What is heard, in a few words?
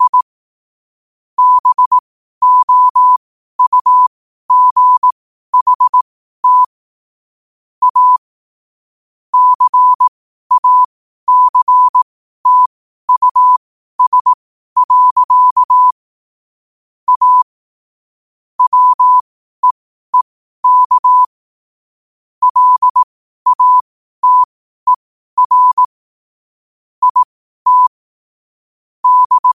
5 wpm, morse